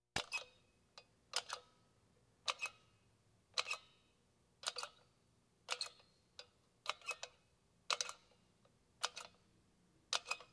Police, Fire engines

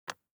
DICE on Glass, Grab, Standard, Two Dice; Samples; ArticulatedSounds; DICE Sfx Party (Extended)

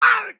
town human road hit; Blind Drive

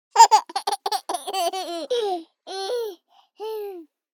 BABIES LAUGH Boy 12 months Giggeling Loud; Baby Boom

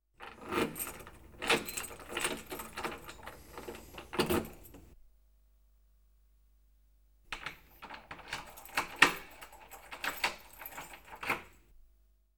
Key Putting Into Lock; KMP Film Video Sound Effects

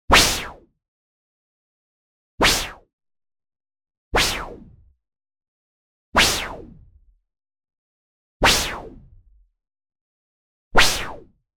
WHOOSH Noise Filter Sweep Short; boom library; Anime Essentials

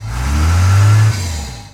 Truck Ram Diesel Rev; Trucks

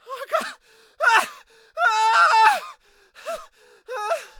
npc male scared; DeadFright

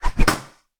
WHOOSH Bright Snap; mono; Universal Sound FX; WHOOSHES

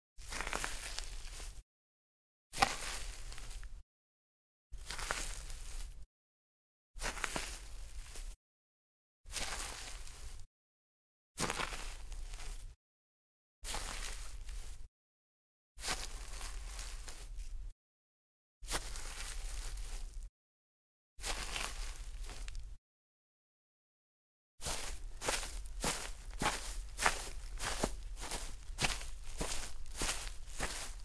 Grass, Foley Footsteps; Sound Ideas

